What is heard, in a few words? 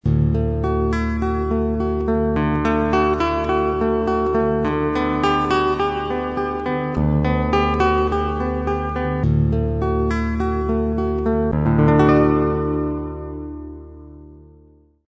Baroque Guitar